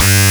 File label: Anasound Short hi G, Industrial Analog Single Notes; Sonic Foundry (Sony Creative Software)